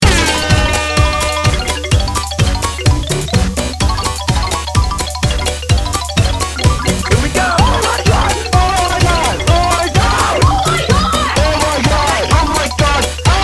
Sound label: REALLY LOUD SPOOKY SONG